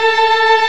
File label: Organ, Barton Bold&Bras